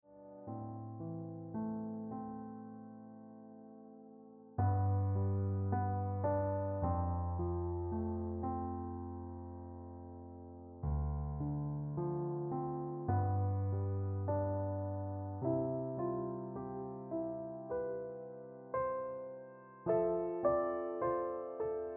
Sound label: Piano Music